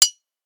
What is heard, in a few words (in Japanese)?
瓶を軽くぶつける音